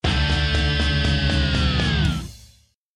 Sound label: Fail; Command The Toa Mahri; Flash Games; Game Music